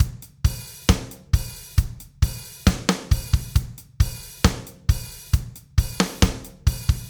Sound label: beat